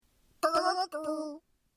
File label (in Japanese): ハトの鳴き声